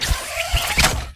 grappler retract; cstrike; pubg

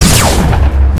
armor hit; cstrike; pubg

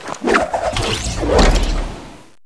parachute open; cstrike; pubg

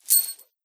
critical hit; cstrike; pubg

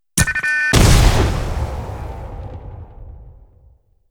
impulse sound; cstrike; pubg

